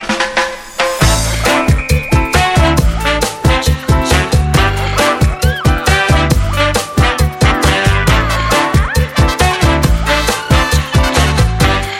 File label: Cha Cha Cha